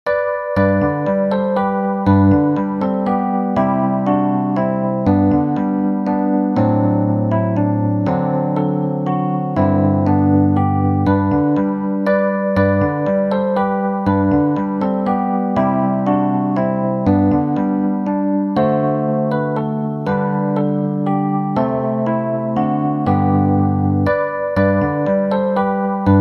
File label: away in a manger epiano; XMAS